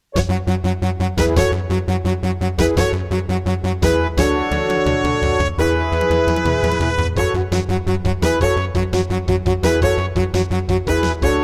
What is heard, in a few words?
Intro, Roland CM-500; 4D Sports Boxing